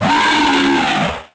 moan; The Elder Scrolls III Morrowind GOTY; XBOX

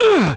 man hit, duel; Pirates Of The Caribbean

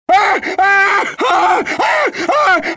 writhe, pain death, male; Soldier Of Fortune II Double Helix; XBOX

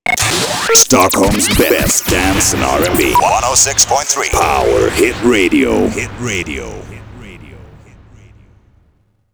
Power Hit Jingle; Project Gotham Racing 2; radio; Power Hit Stockh

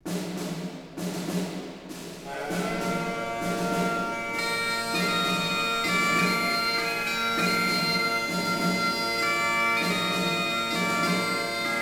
bagpipes; Ambient; MX vs ATV Unleashed

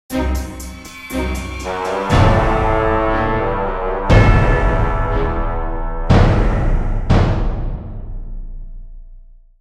Fight Lose; Sulpice; The Da Vinci Code; XBOX

